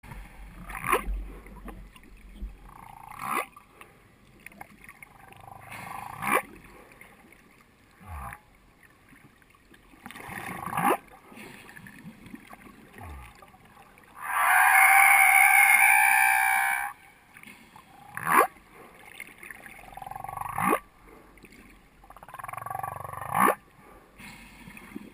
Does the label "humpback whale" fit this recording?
Yes